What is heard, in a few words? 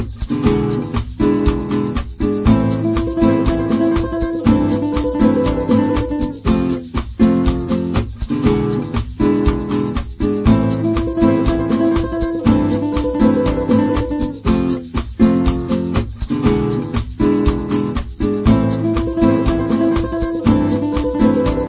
romantic